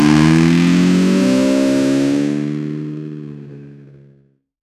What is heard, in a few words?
yamaha 250f sound, motorbikes